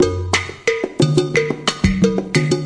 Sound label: afro cuban snappy, 090 bpm, drum loop